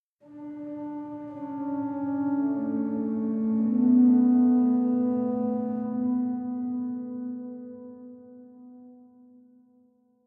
Organ Mid

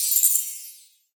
SPELLS Gold

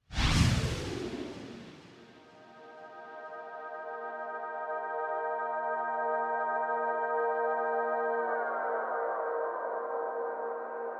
Space Ambience; animal crossing happy home designer

